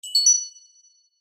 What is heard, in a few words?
win; 2048legend